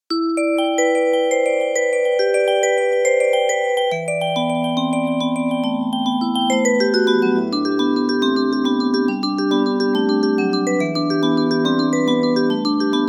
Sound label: Passing Rain, ambient; SKY IM-A600S-Sirius; ringtones